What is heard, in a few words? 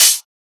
Open Hat; FLPRO